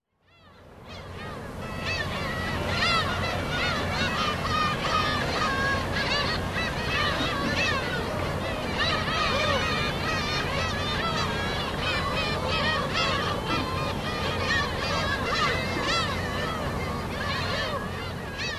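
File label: black-tailed gull, sado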